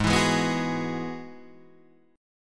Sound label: G sharp Minor 7th Sharp 5th G sharp down Guitar Standard; G#m7#5 strummed; JGuitar